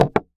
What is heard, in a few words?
wooden small